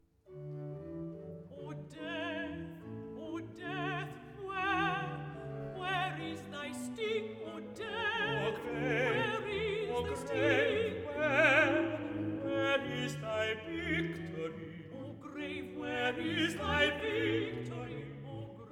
Duetto (Alto & Tenor) "O Death, where is thy sting?"; Messiah